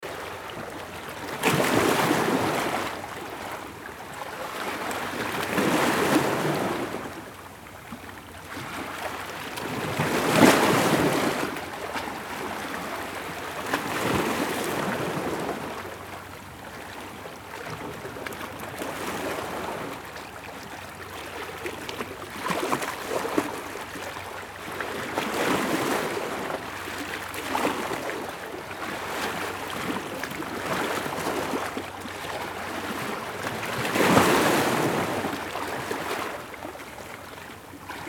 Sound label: Ocean sound effects